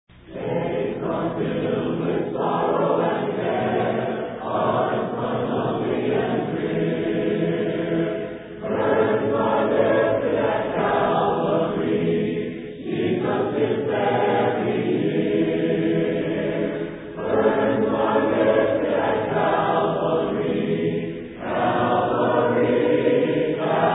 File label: a capella hymn burdens are lifted at calvary